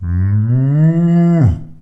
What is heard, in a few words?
Perfect Cow Moo